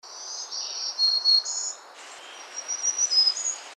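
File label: WARBLER BLACK THROATED GREEN; species/birds; wbba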